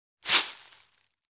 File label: Foliage Impact Soft; hits & impacts